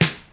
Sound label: Piercing Snare Drum Sound E Key, Royality free acoustic snare sound tuned to the; snare drum samples